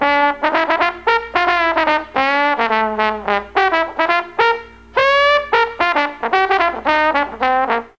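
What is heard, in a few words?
D4 D BONE, mellotron trombone; DIXIE+TRMBN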